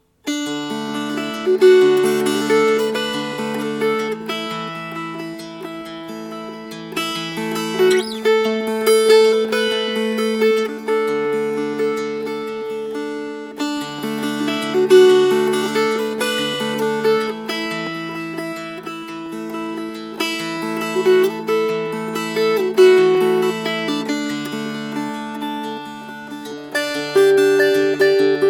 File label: Fingerpicked